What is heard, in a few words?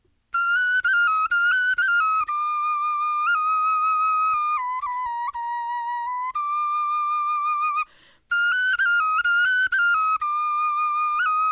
melodie, knochenfloeten